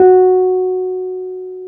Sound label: PNO, Wurly